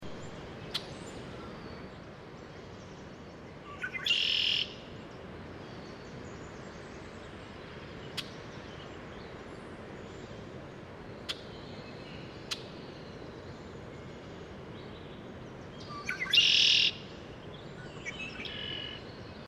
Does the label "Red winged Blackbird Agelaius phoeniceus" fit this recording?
Yes